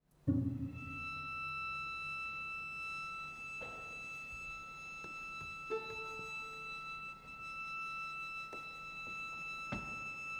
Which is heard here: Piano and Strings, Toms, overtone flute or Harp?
Harp